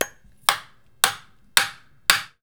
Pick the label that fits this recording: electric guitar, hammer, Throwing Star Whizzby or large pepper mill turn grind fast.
hammer